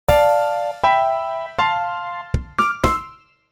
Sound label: logo